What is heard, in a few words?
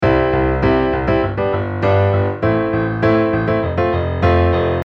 shake beat